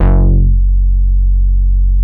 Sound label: DETUNE BASS, VINTAGE VOLTAGE; Masterbits - Vintage Voltage; VV BASSES